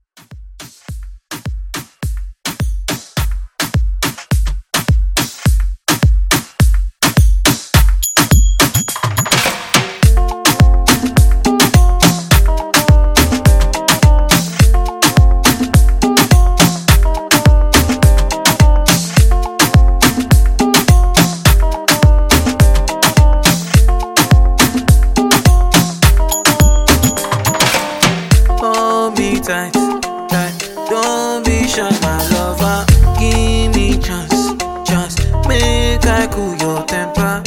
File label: Moomba Re Drum